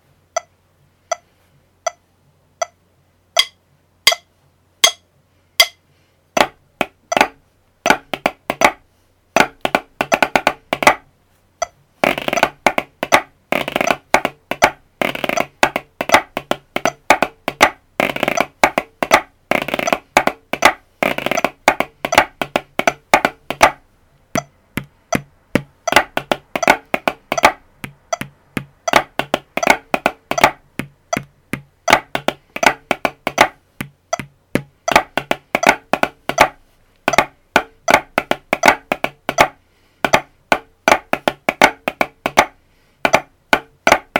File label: Snare, pipe band